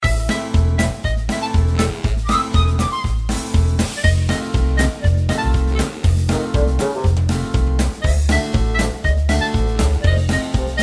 Music Game; Strikeforce Kitty

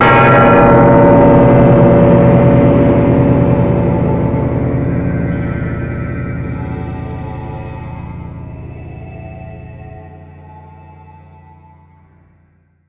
Mus Ring bell; Indiana Jones and the Infernal Machine

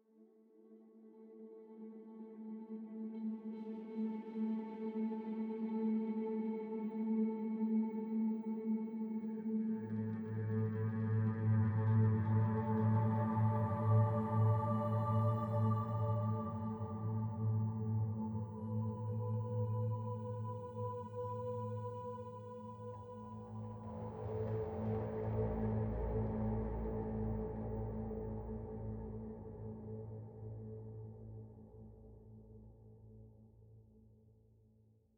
Pad End 85bpm; Legends of Pegasus; windows gamerip 2012

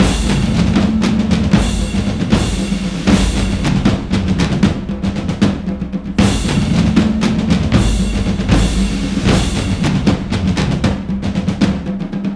Drums; For Honor

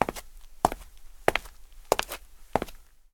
Go downstairs; Zenonzard

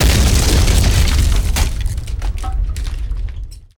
Explosions Building Blast

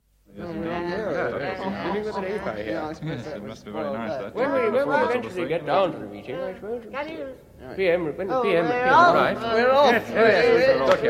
inn ambient

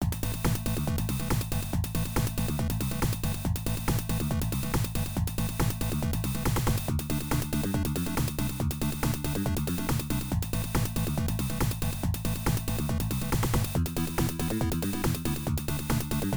Competition Examination Up-tempo, tense

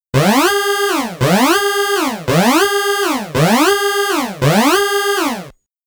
Warning

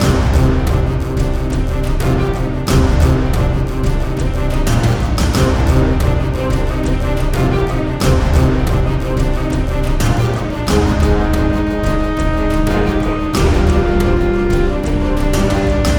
fantasy rpg intense battle heroic loop; heroes of steel rpg; android ios linux macos windows gamerip 2014; imphenzia soundtrack

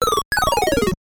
Enemy Falls

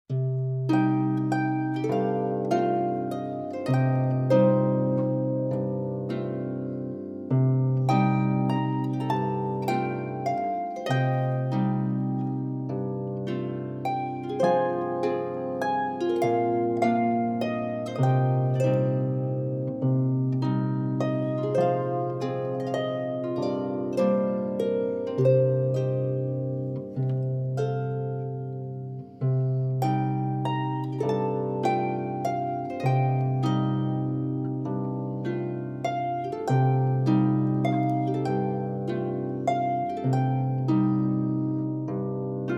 Rain Instrumental Version; karigurashi no arrietty

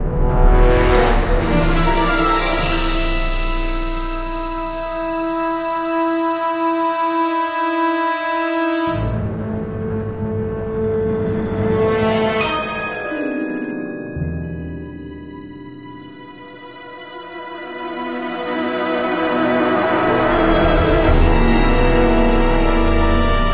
Suspense; Back to the Future: The Game; Episode 1

